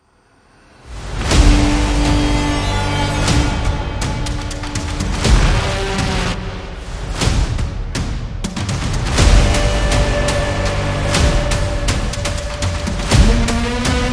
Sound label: Military Drum; dramatic